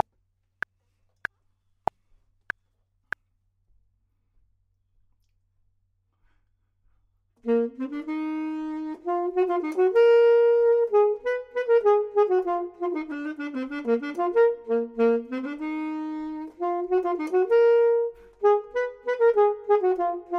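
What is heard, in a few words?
top, Saxophone and Clarinet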